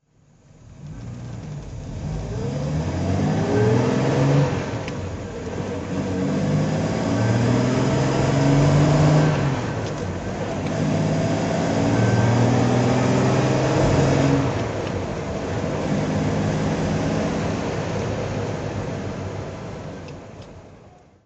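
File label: Jaguar mk2 manual drive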